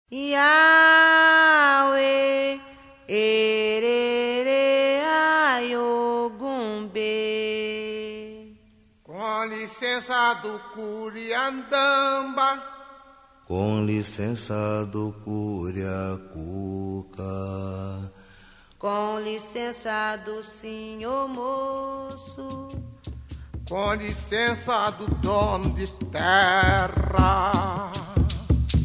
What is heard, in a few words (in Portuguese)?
canto